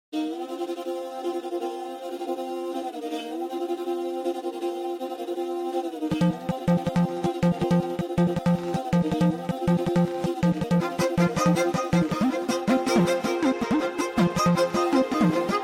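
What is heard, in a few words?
background music ringtone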